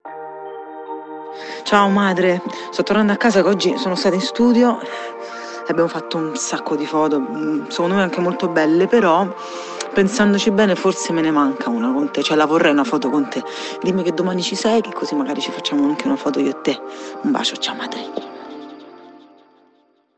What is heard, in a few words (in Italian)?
vocale